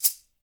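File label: sistrum; Spectrasonics; Liquid Grooves 1 & 2; METALS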